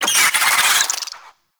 Infested Ashen Vocal Attack Death; Warframe

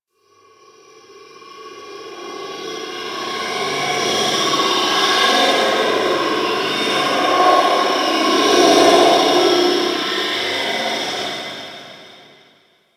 Ice Distant Robotics; Warframe; Corpus Ice Planet

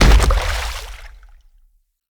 Infested Femur Step Water

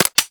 Laser Aim Rifle Alt Mech; Warframe; Grineer

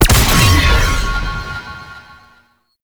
Explosion; Warframe; Sentients; Repeater

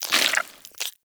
Armadillo Tongue Move; Warframe; Characters; Hunting Critters

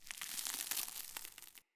alley close crumble; Ambience; Under City

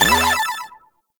Hi Score; Warframe; Nineteeen Ninety Nine; Deep Archimedia

